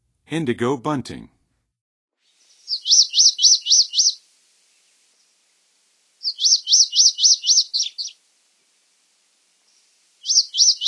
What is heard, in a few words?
Indigo Bunting, Songs of Birds of North America; Western USA; Western USA Songbirds